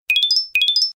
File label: tring tring sms; children